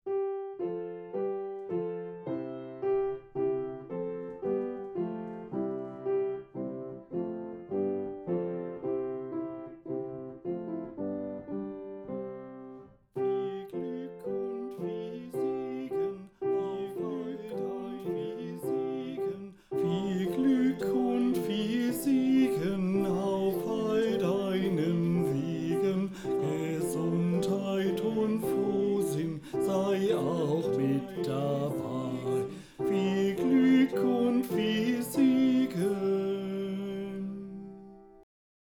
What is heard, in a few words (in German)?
Viel Glück und viel Segen Tenor; Chor von St. Josef